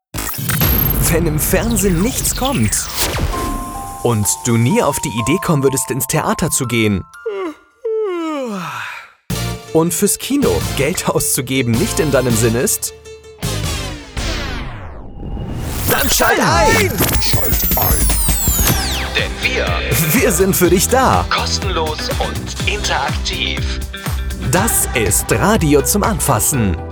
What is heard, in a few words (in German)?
Jingle; SDR; Nicht im Fernsehen